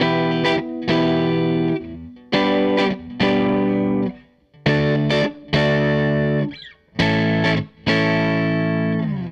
Gretsch Guitar Loop

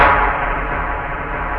SPLACE; East West - Global Sounds; SPATIAL

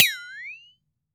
FLEXATONE; AKAI S-Series CD-ROM Sound Library VOL-5

